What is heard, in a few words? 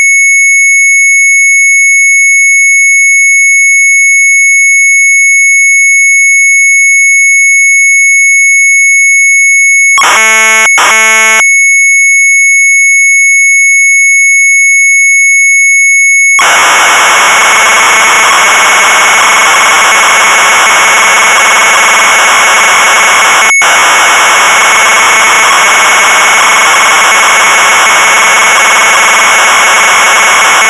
MZ800 Tape, sharp; Plotter vol. 4; retromelsarchive